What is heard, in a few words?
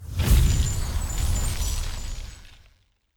Artic Spell Wind